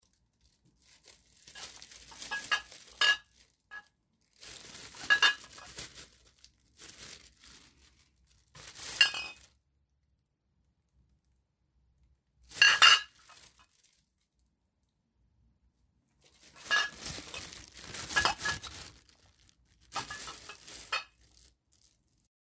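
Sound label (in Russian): пакет в движении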